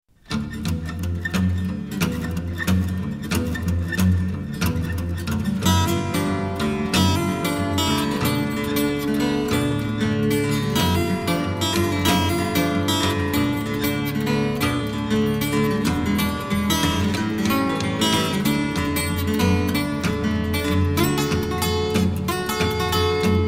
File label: Old country rock